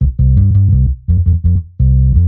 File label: BASS; multitracks